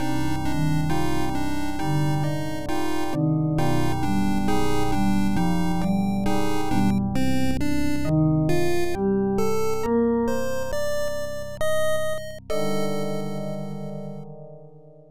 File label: Going Underwater; Eye of the Beholder III: Assault on Myth Drannor; PC-9801; OPNA